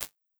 Car Interior, Impulse Responses; Small Spaces; Indoor Spaces; Apple

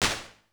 Drum Chamber, Impulse Responses, Rooms; Small Spaces; Apple

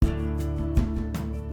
wand Trans

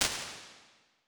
Small Garage, Impulse Responses; Medium Spaces; Indoor Spaces; Apple

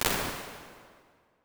Plasterhall; Medium Spaces; Halls; Impulse Responses; Apple

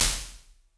0.8s Empty Dining Room, Impulse Responses; Small Spaces; Indoor Spaces; Apple

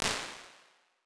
Hotel Entrance, Impulse Responses; Medium Spaces; Indoor Spaces; Apple

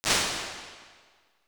String Chamber; Medium Spaces; Apple

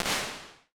Small Studio; Small Spaces; Apple

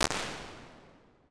In The Lobby; Medium Spaces; Indoor Spaces; Apple

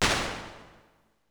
Horn Chamber, Impulse Responses, Rooms; Medium Spaces; Apple

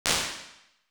Tile Room, Impulse Responses, Rooms; Medium Spaces; Apple

